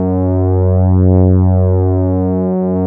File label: PAD PAD